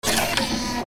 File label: machine scream